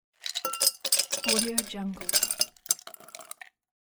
pouring ice

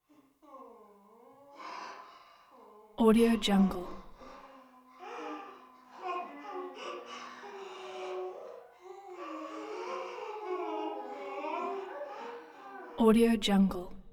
crying moaning 4 or 5 males females

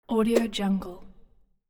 swoosh

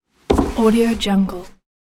wiping cleaning table

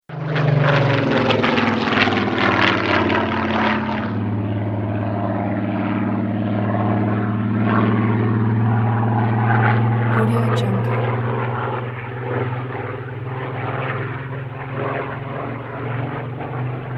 spitfire fighter plane passes and turns

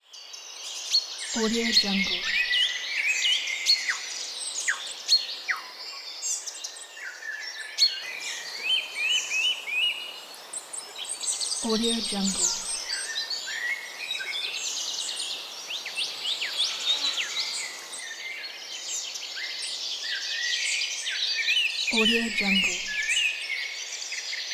forest ambiance; soundsground

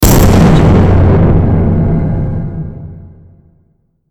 epic impact hit

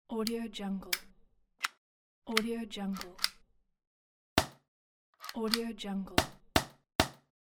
pneumatic gun pack

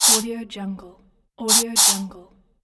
spraying

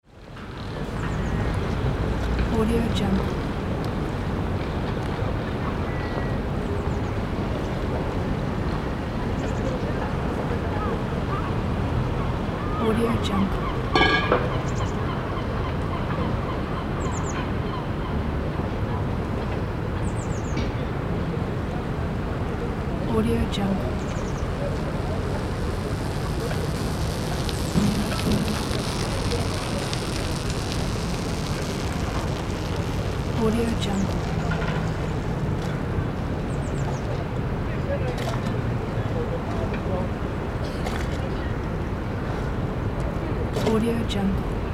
seaside city ambience

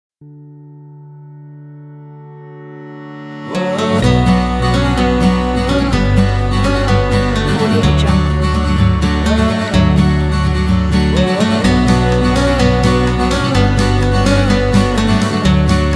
inspiring folk pack

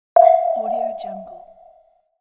radar

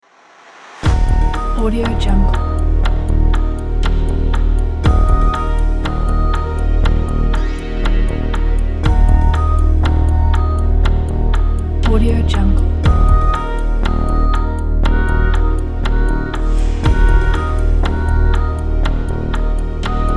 quiz show mystery timer

